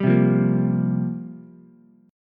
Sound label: F Suspended 2nd Suspended 4th F down Ukulele; strummed; JGuitar